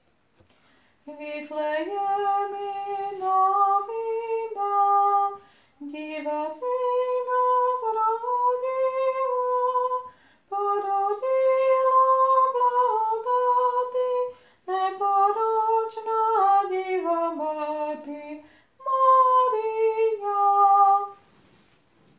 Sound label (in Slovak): vviflejeminovinasopran